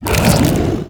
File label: attack; guns n zombies 2014 pc